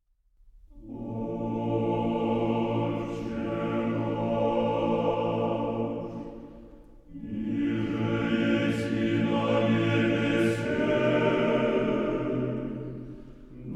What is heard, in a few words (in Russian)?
Хор православный мужской Молитва отче наш